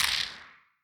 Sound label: Wider Clap